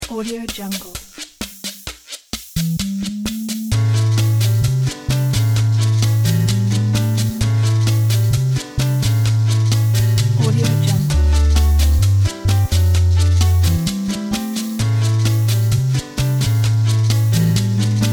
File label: rock